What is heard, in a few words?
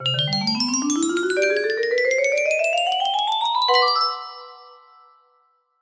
music box melody